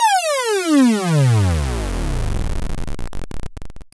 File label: SFX, chillout; Sonic Foundry (Sony Creative Software); Afterhours EDM; Chillout Service